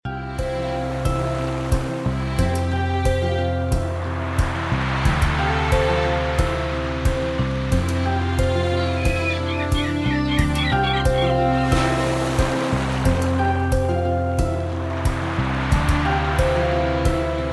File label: samokat ocean